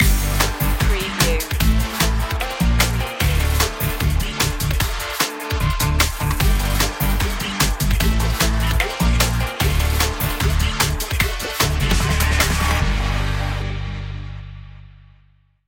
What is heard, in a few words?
fast edgy attitude